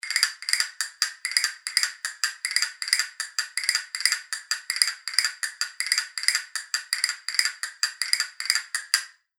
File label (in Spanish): castañuelas fibra